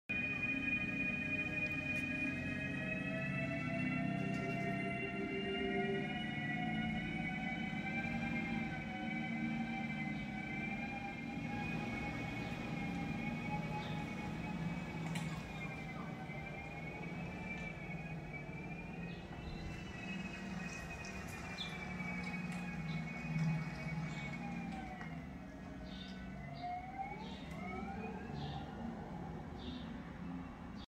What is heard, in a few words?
electric car